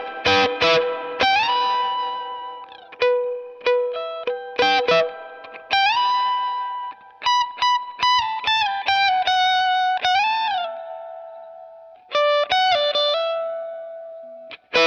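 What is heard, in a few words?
single line reverb, Swart STR-Tweed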